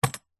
industrial tool box plastic metal latch open

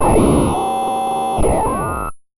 RadioRelay; martyn stonehouse

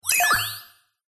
science fiction robot single chirp beep reaction

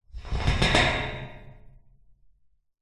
metal bars object run across rattle clunk

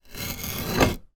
industrial tools stanley knife score cut wood; sound effects one

